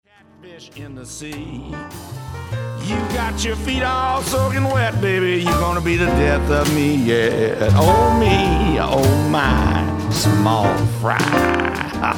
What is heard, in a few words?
jazz bars